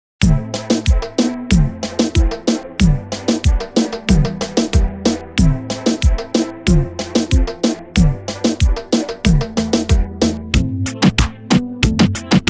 Intro Outro 93 BPM

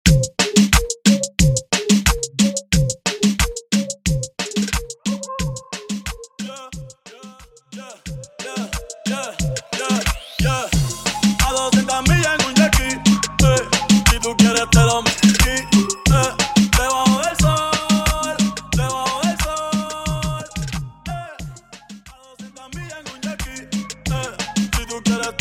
Reggaeton 90BPM; DJ China; Ecuador

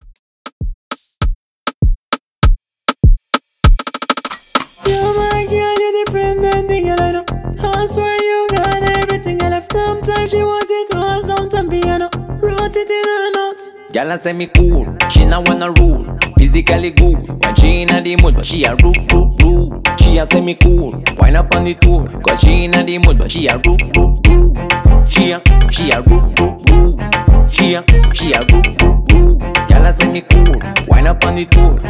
Intro Outro 99Bpm

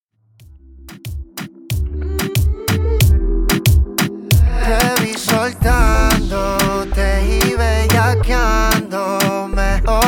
intro outro 92 bpm

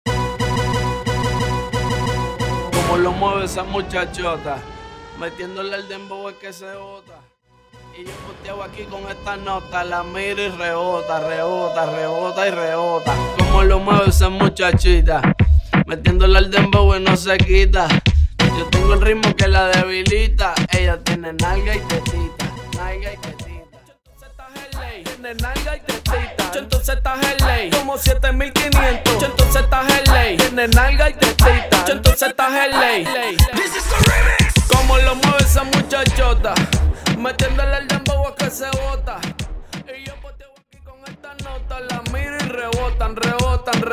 Acapella Starter 90BPM

